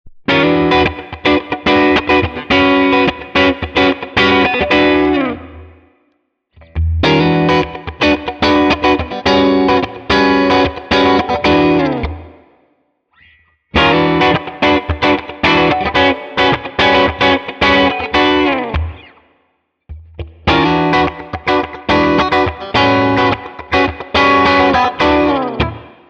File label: Clean Funk All Positions